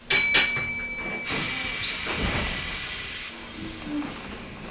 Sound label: train